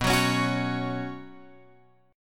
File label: B Suspended 2nd B Guitar Open F; strummed; JGuitar